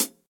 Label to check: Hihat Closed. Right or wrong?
Right